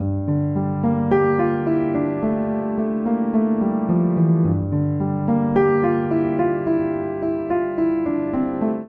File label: Phrase G Dorian 108 bpm, Upright Piano; MIDI Clips; Willow Tree; Upright Piano by Spitfire Audio; Ableton; Factory Packs